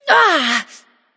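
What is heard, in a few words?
grunt hit, female; smite; voicepack; hera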